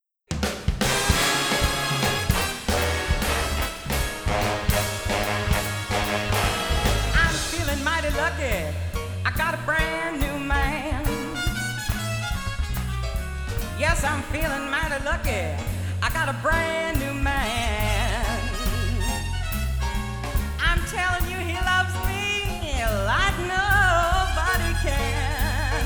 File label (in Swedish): vokalist med storband